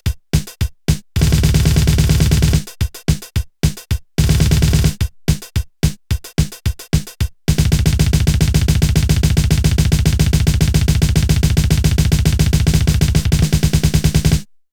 Loop

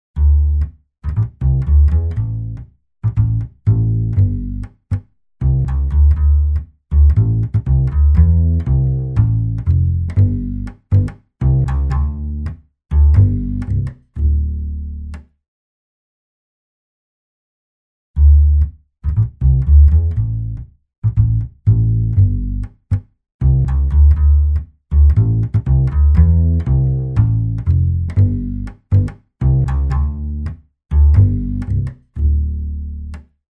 Bass Tube Drive Character; Softube Console 1

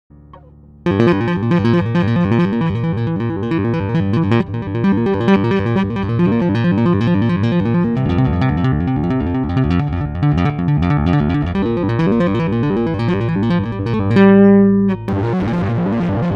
RW Factotum Tap

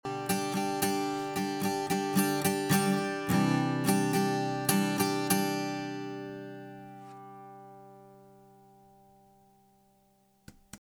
SSL SiX Shure Guitar betont; SPL Transient

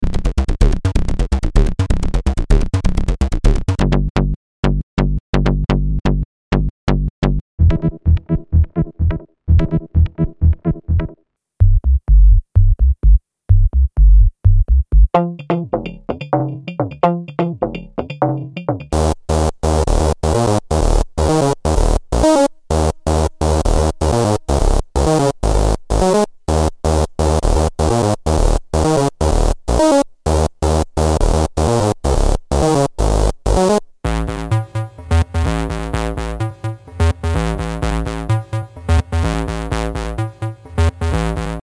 Bass Loops

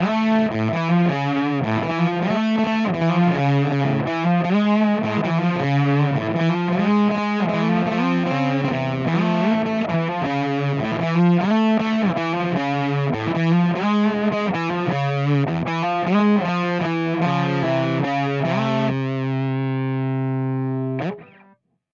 Amplitube X-Drive Fuzz Face

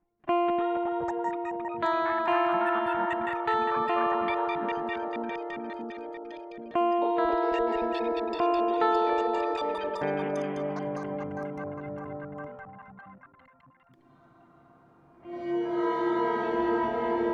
death by audio rooms polymoon gong

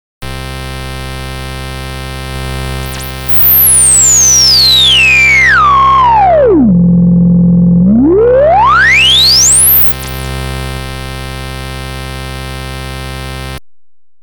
Roland SH-101 Resonance

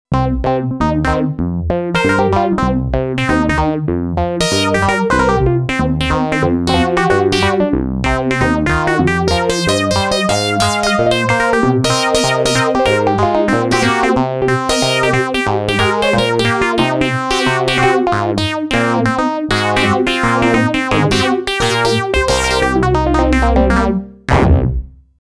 C15 Epiano

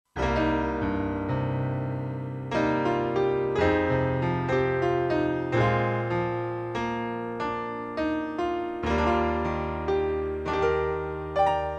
UA 476p boesendorf 290 close; vint 0 comp 0

